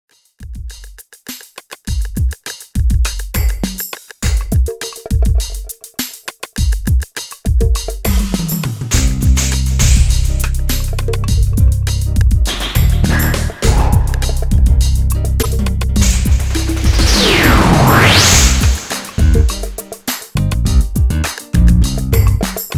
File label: hip-hop